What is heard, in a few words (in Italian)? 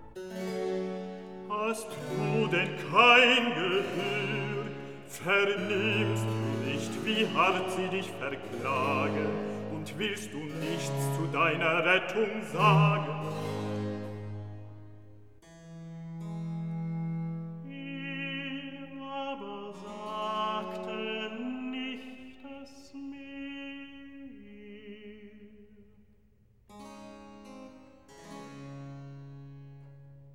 Recitativo; Hast du denn kein Gehör; Handel; Brockes Passion